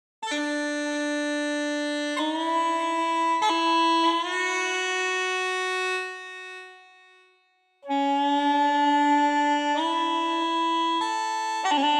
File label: hub mountain melody uilean 95bpm